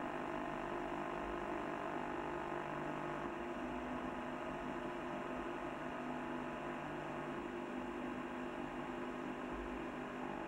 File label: Monitor Work; Game rip; MiSide